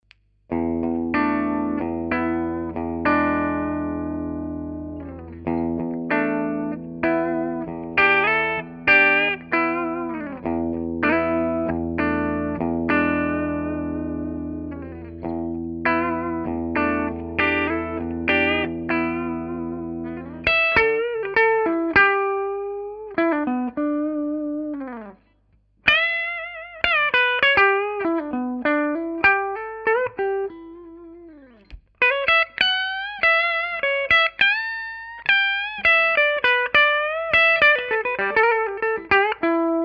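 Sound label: clean bridge, guitar pickups; schwarzbach; HB59-GrowlDogs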